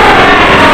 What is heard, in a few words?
Tuned Noise, wavetables; Animoog